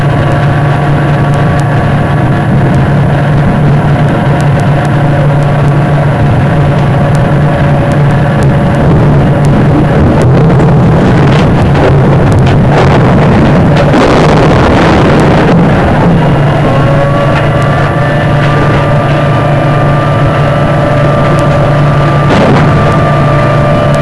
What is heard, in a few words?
rocket